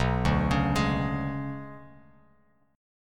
B Minor 6th Add 9th B down Bass Standard, Bm6add9 chord; Bm6add9 arpeggiated; JGuitar